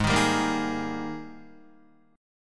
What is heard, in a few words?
Ab Major 7th Suspended 2nd Ab down Guitar; strummed; JGuitar